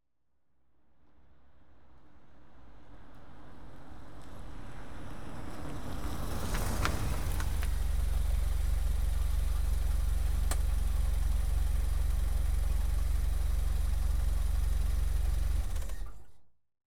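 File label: Vauxhall Carlton, Saloon, Exterior; Auto; BBC Sound Effects Library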